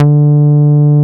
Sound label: HOUS D4, HOUSE BS; Drums In Your FACE!